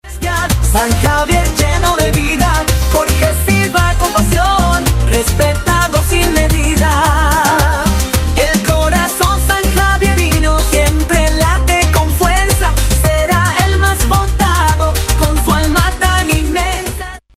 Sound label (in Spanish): jingle